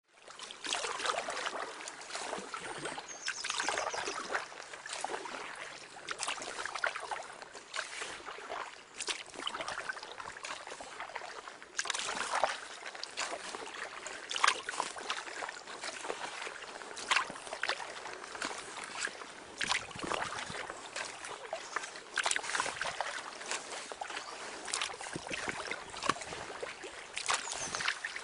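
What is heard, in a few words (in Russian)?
Каноэ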